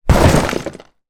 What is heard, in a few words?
foley cardboard box impact containing plastic lego and toys; zapsplat